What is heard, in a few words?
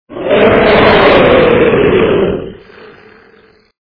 dragon death